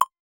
ui sound for when you; mythology

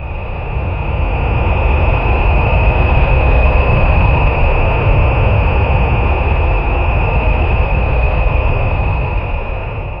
continuous looping player, flight

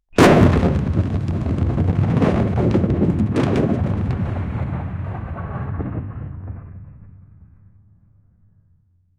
realistic whooshing sound, weaponry

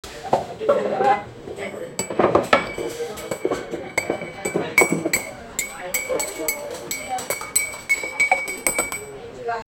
rich soundscape of a live, chair scrape

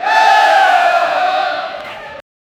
sounds from a game of, basketball

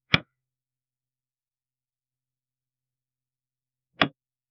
cricket ball fine inside

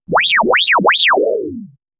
a simples cartoony audio, achievement

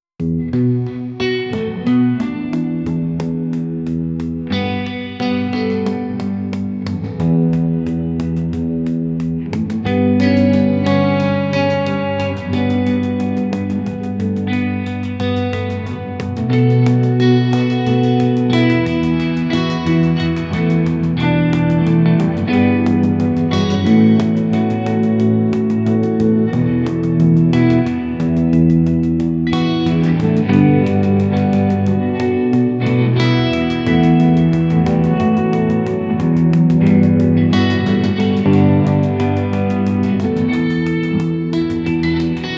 a funksoul guitar bass, bass guitar; a funksoul bit guitar bass

